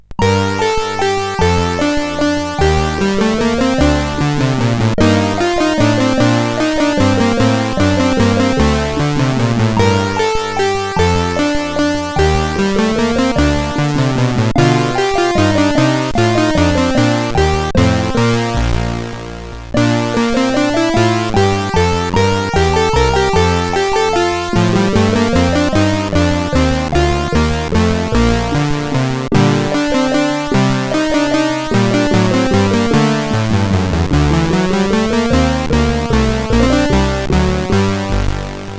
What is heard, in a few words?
Menuet G moll, CSG8580R5; Froyd